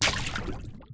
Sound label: Small Splash; A Difficult Game About Climbing